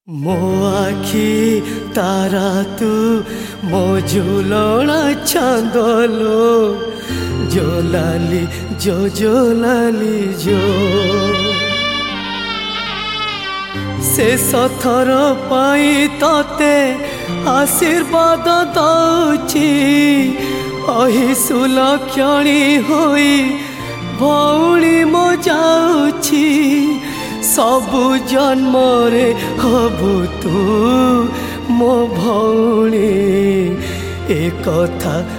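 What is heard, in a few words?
sad version, Odia Song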